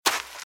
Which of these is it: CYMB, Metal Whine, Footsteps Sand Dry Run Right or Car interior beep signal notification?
Footsteps Sand Dry Run Right